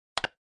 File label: button